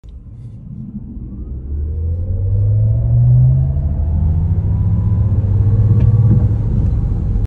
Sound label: maserati grecale folgore sound